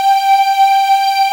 breathy pad, Akai S-1000, G5; Samples vol.55 - Retro Sampler

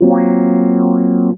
ORG CHORD, Synth FX Misc

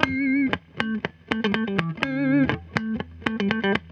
GTR, Roots Disco; USB Soundscan vol.32; AKAI

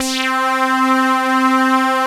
CS60 BPF; Rubber Chicken Software Sample Bank

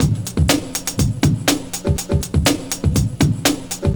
Lo Fi Loop, Drums & Percussion, Electronic; Sonic Foundry (Sony Creative Software) - Electronic Point Blank

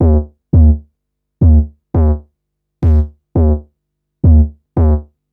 Psycho Bass; Drum & Bass; Fluid Dynamics

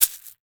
Household Shaker, household rhythm samples Hits

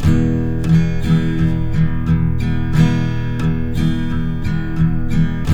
Guitars; Spectrasonics; Hans Zimmer Guitars VOL-1

